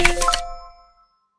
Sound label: open